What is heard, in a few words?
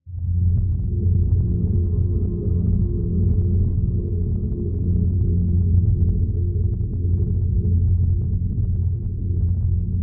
Door; Game Concept